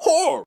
Scream

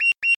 result count

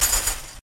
skill chain end

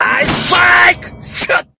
Icespike shoot

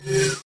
Warp out

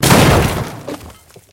hit ufo

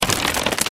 split land; Monk

